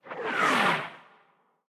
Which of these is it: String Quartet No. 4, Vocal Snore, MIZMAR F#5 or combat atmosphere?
combat atmosphere